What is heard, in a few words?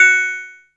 DULL, PERC HITS; Zero-G Ambient 1